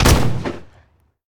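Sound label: Shotgun; We Are the Dwarves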